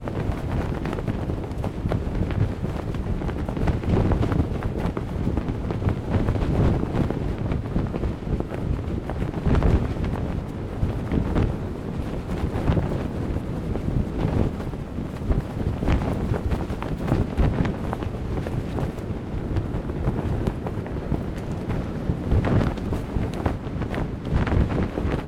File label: wizard flight